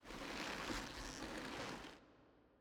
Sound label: ambience room torture tripalium leather